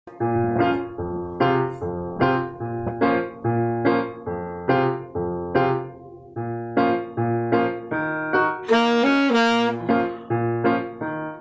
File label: horn riff for verse